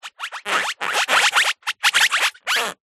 vehicles bicycle wheel slow roll rub squeak concrete ground; zapsplat